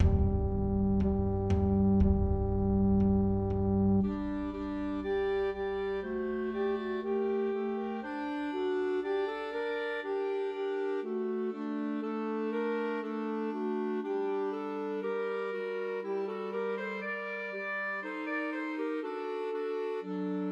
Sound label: Trios for Two Clarinets and Alto Saxophone; Pat a Pan; Christmas